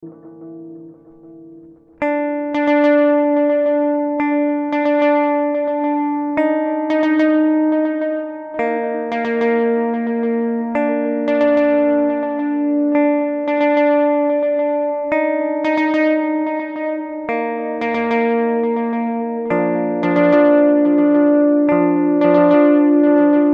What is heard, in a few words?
drum